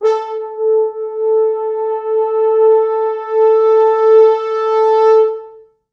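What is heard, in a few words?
4 French Horns, Horns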